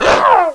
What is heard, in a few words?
Gorilla Death; Playable Tutorial Level; TR1